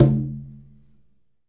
Conga Low; Essential Percussion

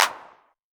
Clap, Novation Drumstation

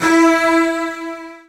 E3, Strings; Virtuoso Strings; ILIO; Kirk Hunter Virtuoso Strings VOL-2; 90 s Sample CDs

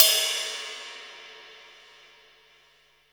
Boss DR-660 Ride, Drum Machines

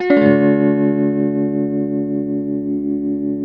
TELTMUMAJ D#, TELE CHORDS, Guitars; Spectrasonics; Hans Zimmer Guitars VOL-1

